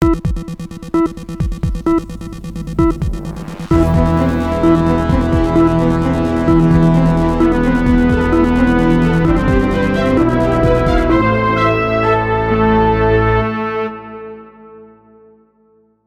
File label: Breaking News Intro